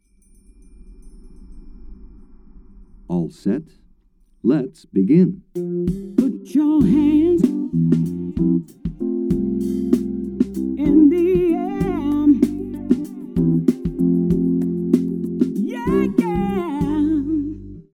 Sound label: eq peak 250